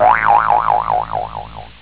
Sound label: boingoingoingoingoing